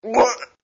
Gag; Lewd SFX

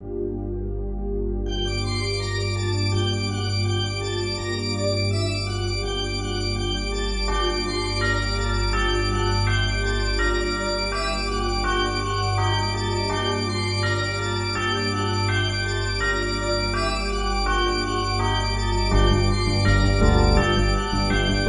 Cathedral Remix

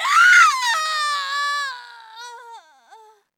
Long Scream

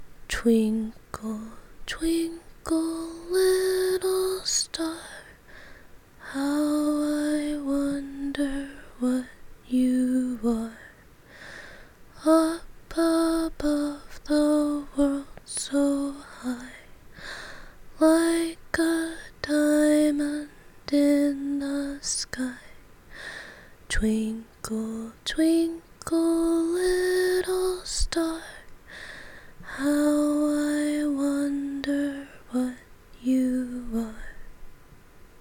Little Lullaby, Singing, short; F4A; Comforting You with a Little Lullaby

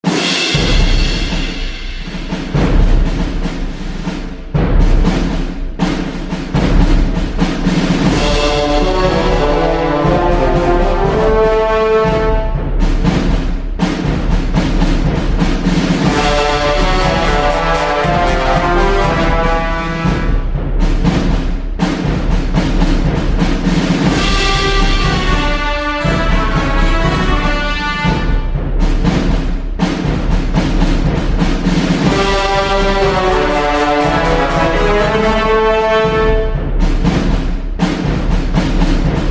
Orchestra; My Little Orchestra

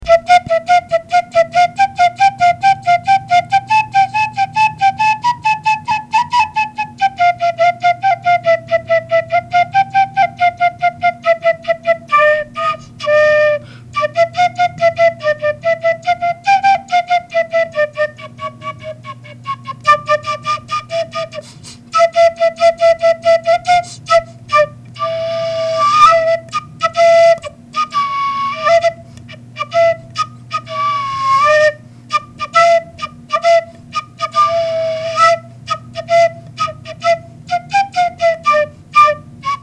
Recorder, Anthem

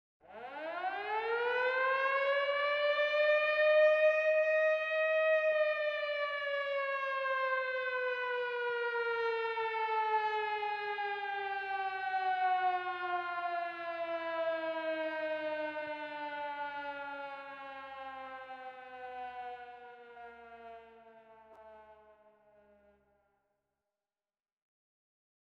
Air Raid Siren; Pony Fan Music Remix Stems; The B Word; 85 BPM

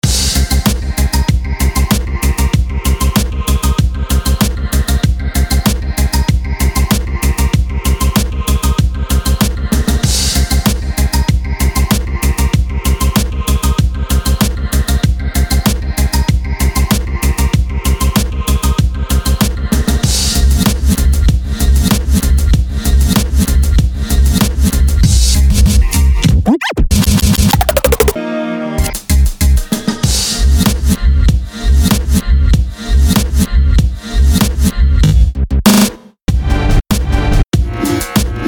breakwave

